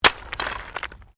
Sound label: Digging Pick Stones; Various digging tools and processes; Construction Sound Effects; Construction (Sound Effects:Backgrounds)